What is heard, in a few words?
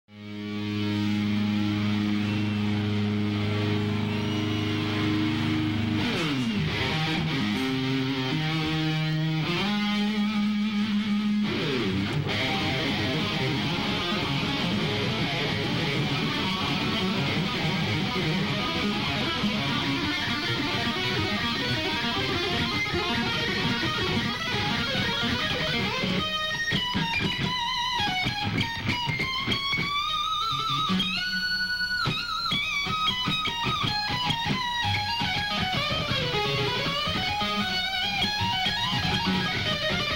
guitar solo